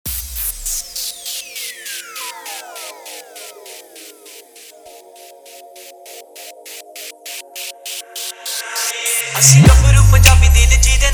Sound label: Remix Song